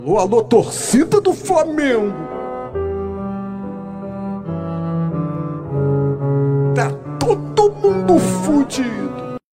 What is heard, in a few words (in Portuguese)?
alo torcida; du flamengu